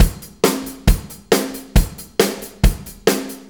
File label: 4-4 Loop, Drum Loops, Full Kit Loops